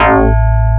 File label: MARIMBA BE